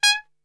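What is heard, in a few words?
G#2 piccolo trumpet short, Brass; Best Service; Brass Super Section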